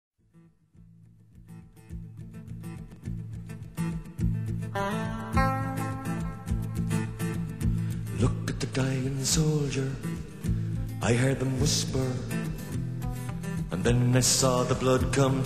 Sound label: dying soldier